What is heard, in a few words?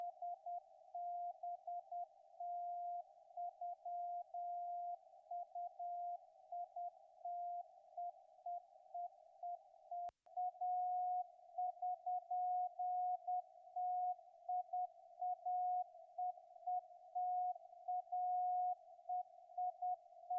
CW; Hamradio beacon auf 28257KHz in CW.; ColibriNANO